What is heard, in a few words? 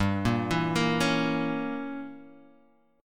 A sharp Diminished 7th G